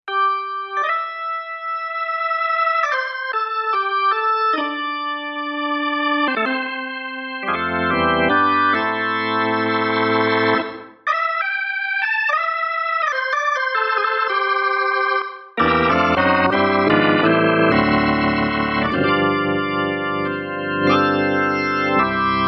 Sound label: Full Organs